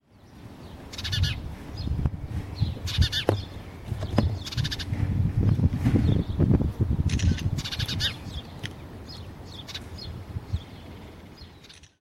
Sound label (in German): spatz